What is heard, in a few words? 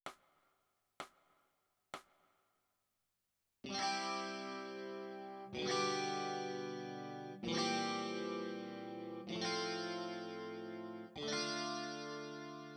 E Guitar